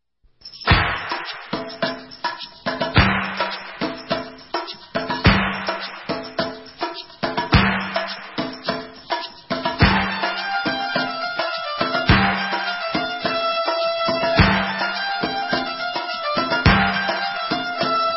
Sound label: Debka